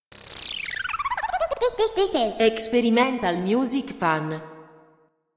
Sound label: Jingle